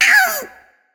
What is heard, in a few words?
Giggle